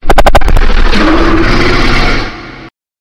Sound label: monster shade